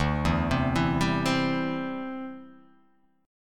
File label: D 7th Suspended 2nd Suspended 4th